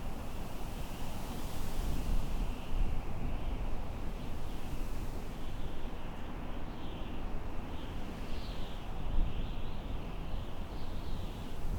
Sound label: outdoor highway; anemoi